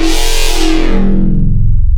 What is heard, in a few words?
Lowpassed Wub, wavetables; Cymatics; Essentials Wavetables; Serum